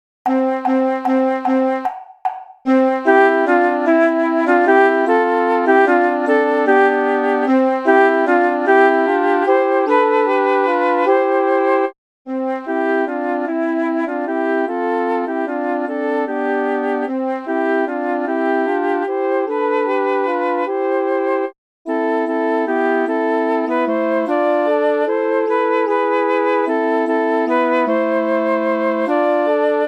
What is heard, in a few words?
cantate domino piano